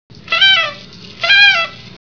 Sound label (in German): partytroete